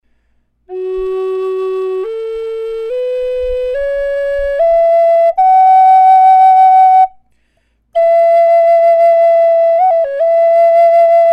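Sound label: natural, flute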